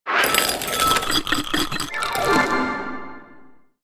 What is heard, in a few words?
Shuffling